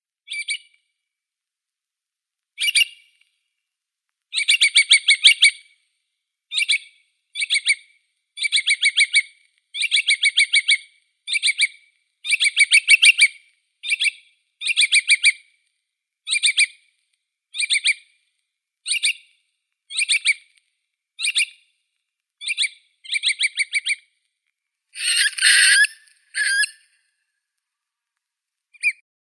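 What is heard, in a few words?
little curlew, Numenius minutus; kronshnep malutka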